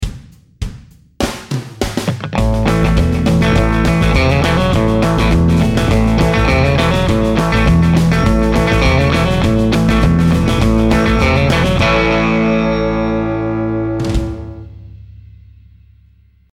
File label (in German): Country Licks Lick